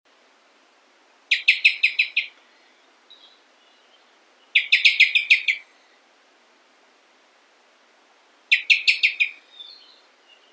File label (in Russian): drozd belobruhii turdus cardis